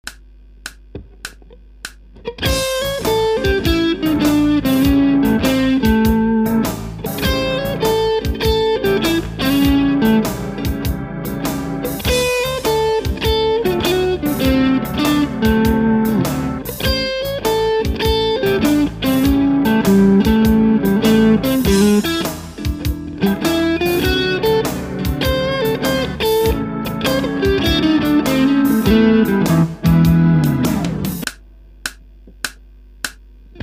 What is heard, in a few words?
Blues Etude